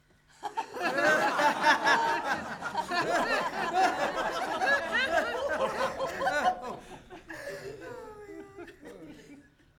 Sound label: Laughing Audience Laughtrack